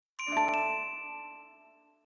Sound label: ps3 game startup chime